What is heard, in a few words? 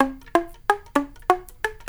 Natural Flute FX, Jazz Solos and Sections, Traditional; Flute Loops; Sonic Foundry (Sony Creative Software)